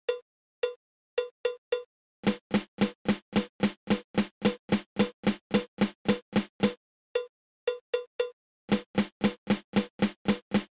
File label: Flam